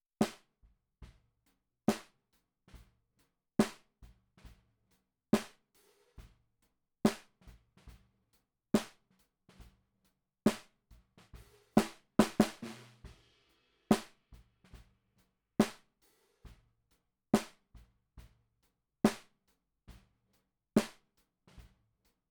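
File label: Snare Bottom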